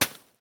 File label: tools snowball